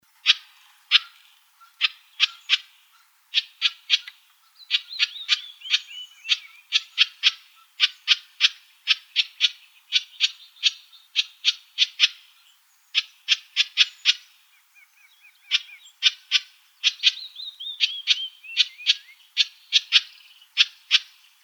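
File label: House Wren CALLS; HOWR; deviche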